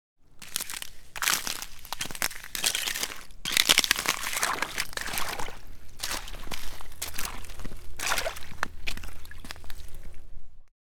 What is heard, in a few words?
Footsteps walking breaking frozen puddles